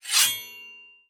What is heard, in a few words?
Sword Katana Scrape; Wieldables